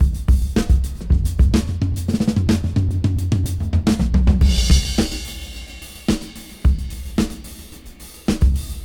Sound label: Rap Dry 106 BPM Rev; Rap 3 Dry; AMG; Keith LeBlanc Phat Butt Jams